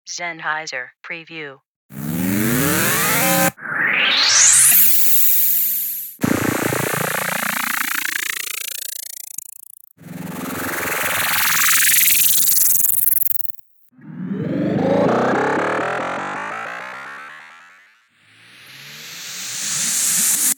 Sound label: rise fx; zenhasier